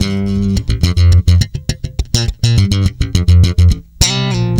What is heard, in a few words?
G, tweaky, bass; Spectrasonics; Bass Legends